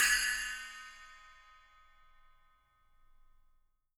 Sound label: 6 SPL 1, FX CYMBALS, Living Drums; Sampleheads; Peter Erskine Living Drums